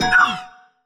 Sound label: pain, robot; Learn to Code From Zero With Godot